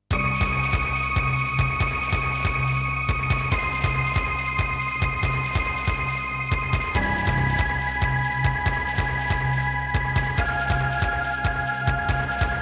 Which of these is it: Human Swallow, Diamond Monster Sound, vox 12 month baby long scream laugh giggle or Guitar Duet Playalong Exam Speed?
Diamond Monster Sound